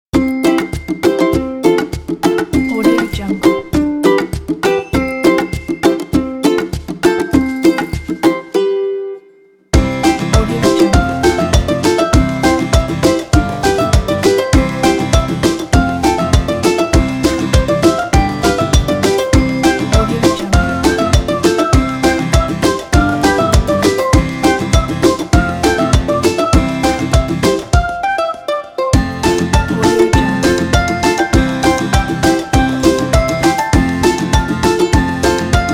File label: happy